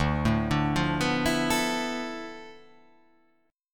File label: D Minor 6th Add 9th D